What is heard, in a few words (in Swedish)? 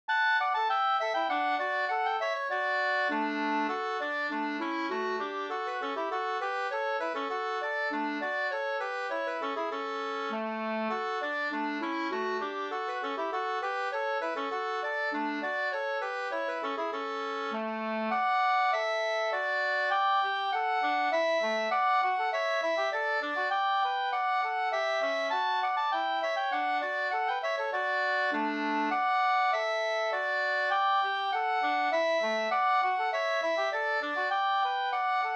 folkdans, polska; Knytnavspolska 2st